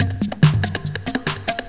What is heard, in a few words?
kick beats